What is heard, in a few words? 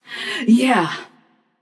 yay passion; chaac